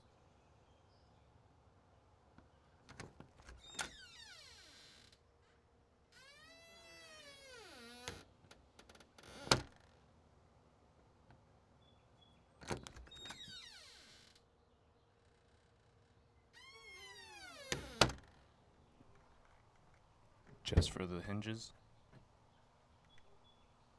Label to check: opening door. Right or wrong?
Right